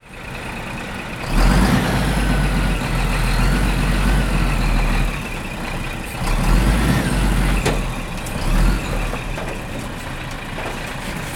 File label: truck departing; transportation